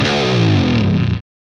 Guitar dive bomb

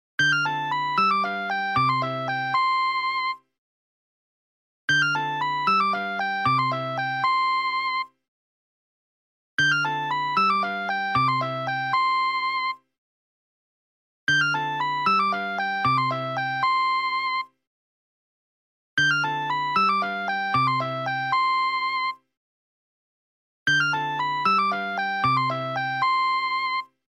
First Nokia Tune, ringtone

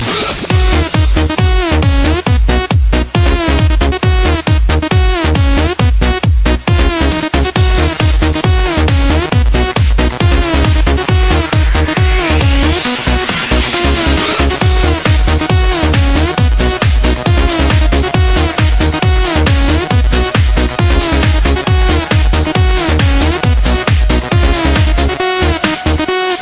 techno diskotek, ringtones

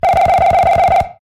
Slipping; SMB3

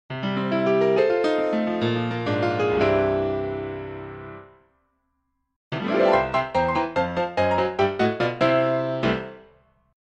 Battle Jingle; Terra Battle

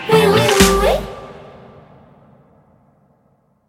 Wee Woo

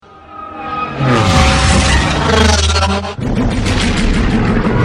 pod racer; sebulba

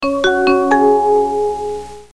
Chime; Intel

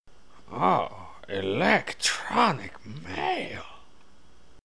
email msg tone; ringtones; sms

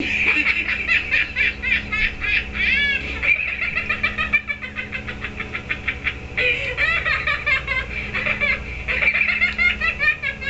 ahahahahahahahahaha; children

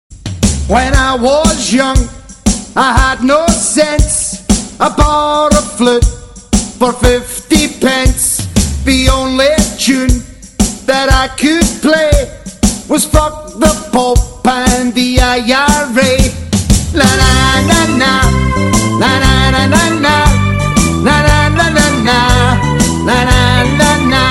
flute; rangers

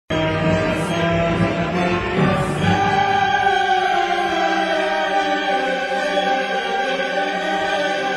BATTLE MUSIC

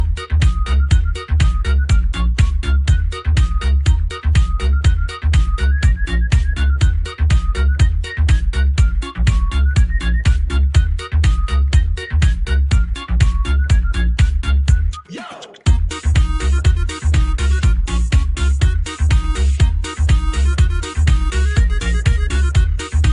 polka

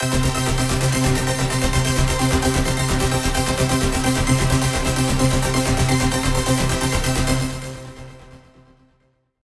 Dance Arpeggio

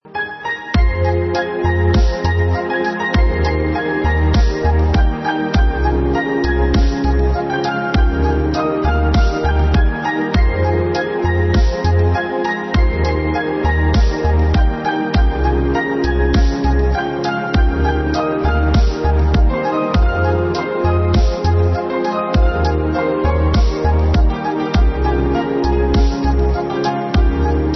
piano

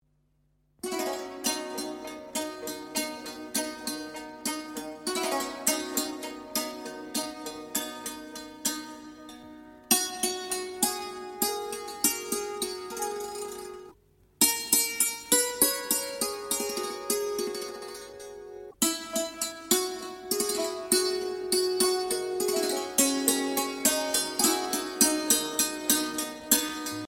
smooth instrumental

